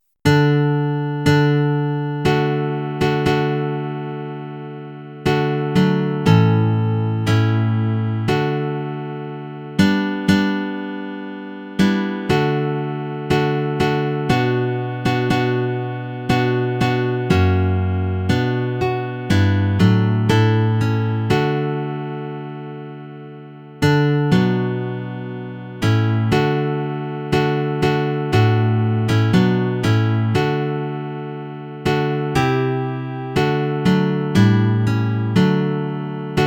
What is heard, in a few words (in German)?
D Dur Nylon Guitar